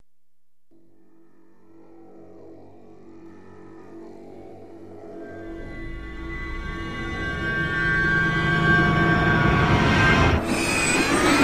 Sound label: Starship By Buildup